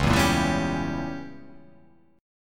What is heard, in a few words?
C sharp Diminished 7th C sharp down